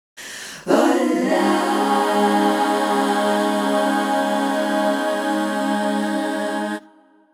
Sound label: chor olaaa